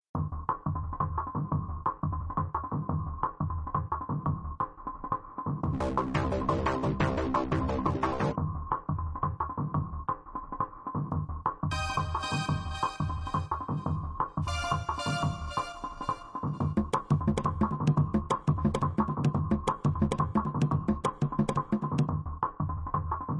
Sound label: rhythm